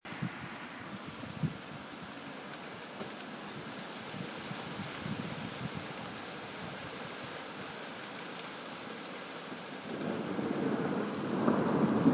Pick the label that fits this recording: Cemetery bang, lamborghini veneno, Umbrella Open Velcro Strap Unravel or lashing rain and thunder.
lashing rain and thunder